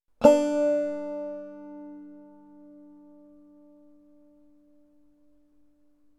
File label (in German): zeigefingeranschlag